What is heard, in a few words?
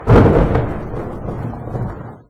lightning Bolt; dnd; magicApp